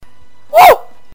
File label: scribble